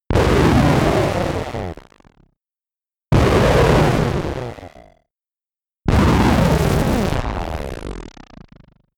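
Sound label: glitch, electronic; comedy cartoon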